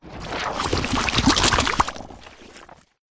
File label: cartoon slime monster bubbling wet gloop movement